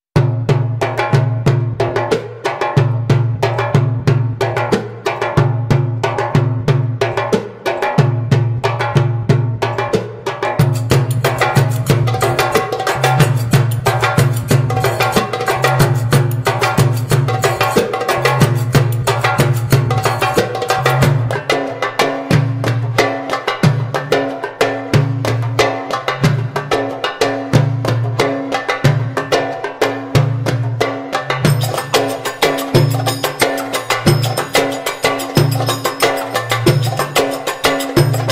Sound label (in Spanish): arabes para fl studio